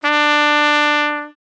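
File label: Trumpet D5; DirectX SDK; DMusProd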